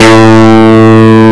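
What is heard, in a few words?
60s Rock Guitar, Medium overdrive guitar A2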